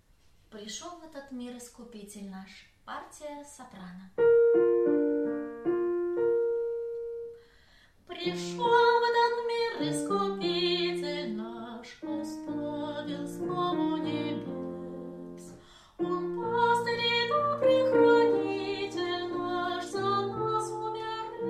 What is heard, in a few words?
soprano; epiphany; Prishyol v ehtot mir iskupitel